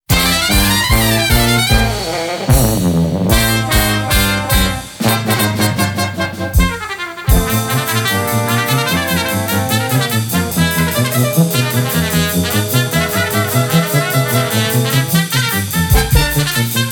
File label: brass quintet